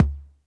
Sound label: Toms, Elektron MachineDrum SPS1 MKII